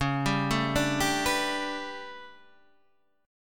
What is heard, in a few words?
A 11th Db, guitar chord